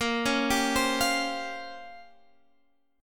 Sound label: A sharp Minor 6th Add 9th A sharp down Guitar Half Step Down, chord